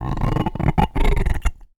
Finger Rub, Large Wine Glass

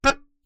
Melodica Short Note

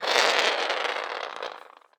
Roll On Floor, Medium Wine Glass

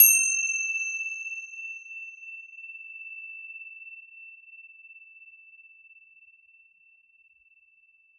Tibetan, Cymbals; Percussion Samples

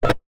Single Shunt, DVD Drive External

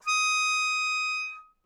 Melodica Long, Long Notes Chromatic

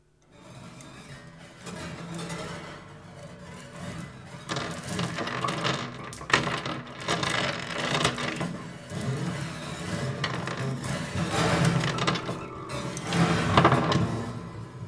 Marble Roll Rattle, Piano

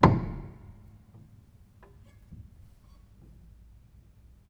Hamm Perc, Piano Hammer Perc; Piano Miscellaneous

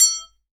Aluminium Tube Drop

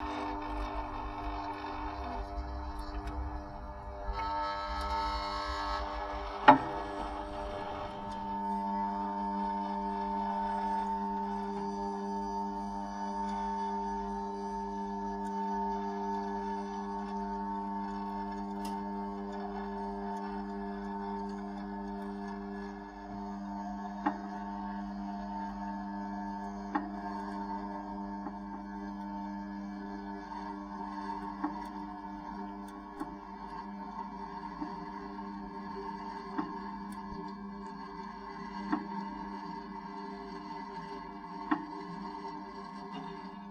Toaster Hum Loop; Kitchen

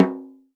Snare Drum; Percussion Samples

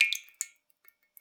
Drips

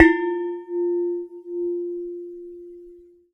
Lid, Kitchen

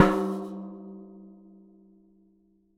Washing Machine Drum Inside Strike

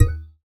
Classical Guitar Extended Technique

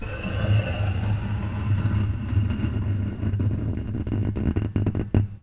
String Scrape, Piano; Piano Miscellaneous

